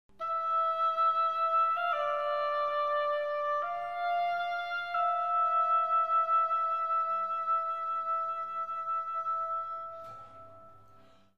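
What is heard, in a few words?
theme melody; Myst III Exile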